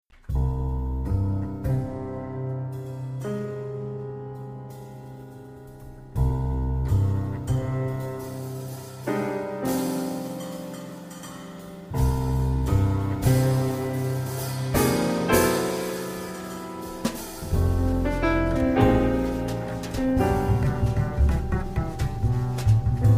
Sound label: melody for a bass